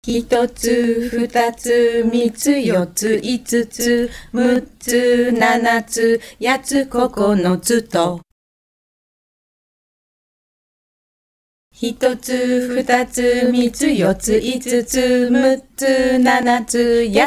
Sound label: Counting Song, Japanese